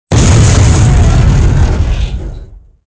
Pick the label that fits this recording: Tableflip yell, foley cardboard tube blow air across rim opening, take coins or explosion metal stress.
explosion metal stress